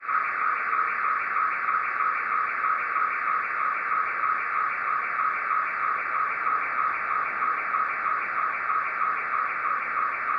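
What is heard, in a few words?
sounds of a car alarm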